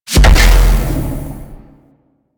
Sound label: Beam Fire; Warframe; Quests; The New War; Erra; Abilities